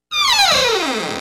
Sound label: Door Open